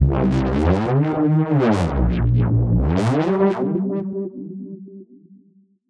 Tech Synth 120 C, techno; musicradar; Synths

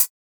Closed hat, techno drum

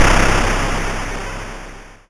YM Noise; NOISES; ChipShop Deluxe; ChipShop Hits

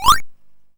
YM FX; SFX; Minnow; ChipShop Deluxe; ChipShop Hits

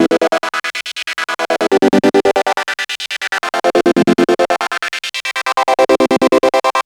Chord C, 140 BPM; loops; CHIPSHOP DELUXE

